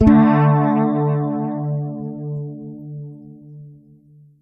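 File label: major double D, Pedulla bass; Masterbits CLIMAX 7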